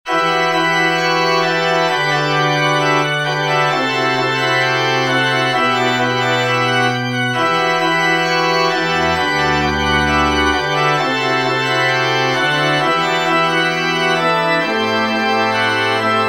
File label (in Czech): varhany; Ostří jarních stébel